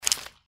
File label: mantis arm attack; npc